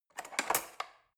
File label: cutlery fork drawer handle scrape soft